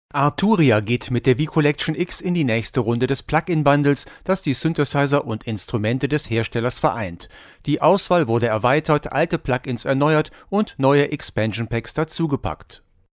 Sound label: Universal Audio SC-1 LD 87 Vintage Filter